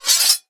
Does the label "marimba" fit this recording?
No